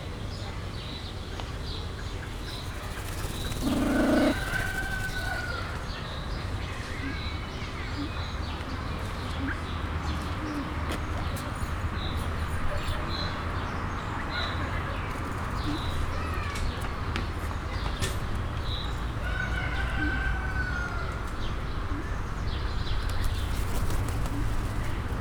középen ezüstfácán, jobbra vadászfácán; kecskemeti zoo 2016

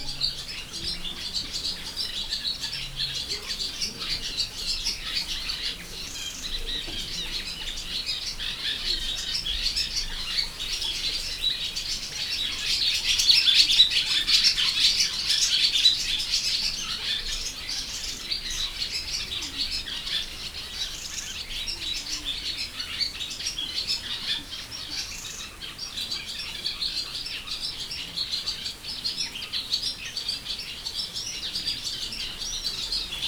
madármegfigyelőház ajtajából balra békák hortobágyi halastavak, parti fecske; allathangok; termeszetben

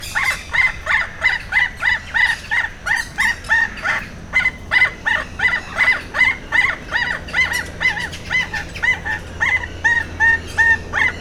zöld papagáj féle; madarpark

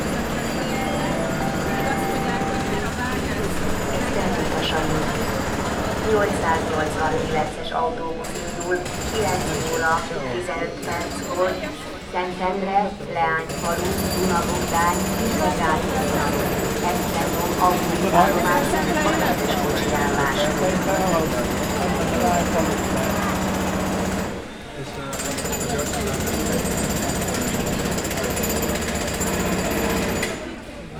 helyközi buszpályaudvar hangosbemondó légkalapáccsal; busz tavolsagi2014

